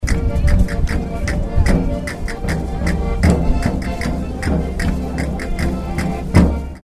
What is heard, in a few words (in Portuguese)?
banda carcavelos